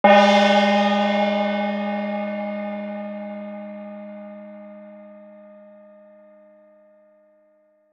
PERCUS

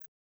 Mentioned; mention sounds; overte

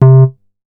Moog Dubba; leo-sounds

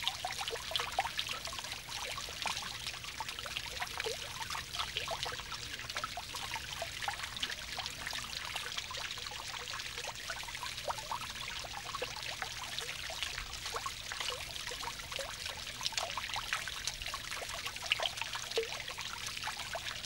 Water Liquid

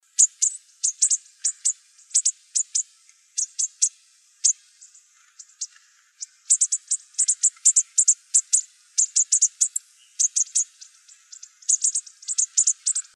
bushtit; Animals

